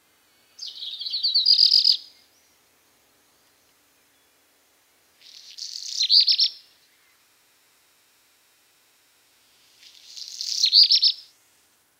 rougequeue noir chant

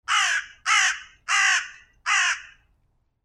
corneille

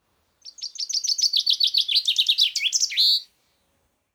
pinson des arbres chant

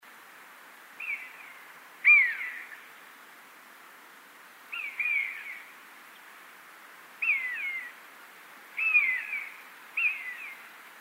buses distantes

